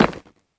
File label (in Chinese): 下界砖踩踏声